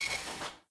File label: UI Pop Up Photo; Event Collection; blue archive